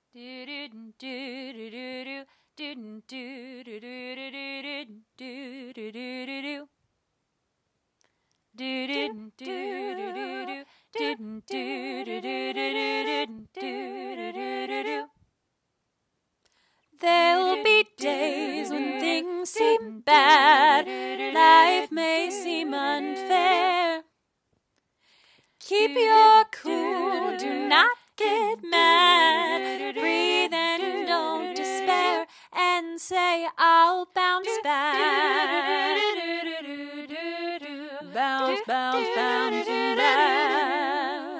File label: Acapella